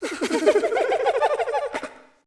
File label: chasing; chiabihamaru; cv project; invading executioner; zerosiki; slender